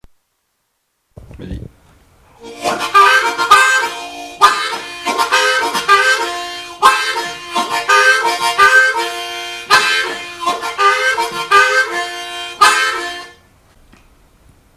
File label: Railroad, harmonica